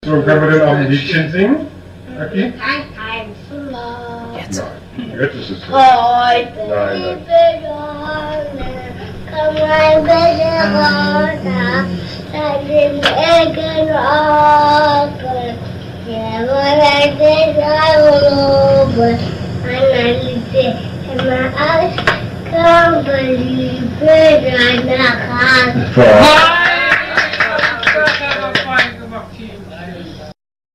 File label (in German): lied